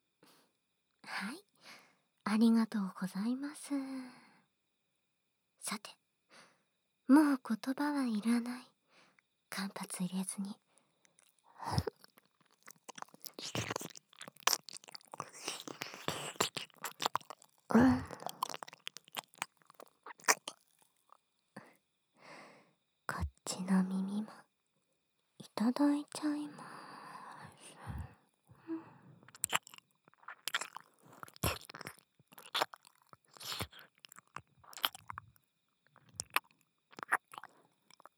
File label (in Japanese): 耳舐め(右), ASMR